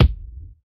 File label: thick kick drum sample a sharp key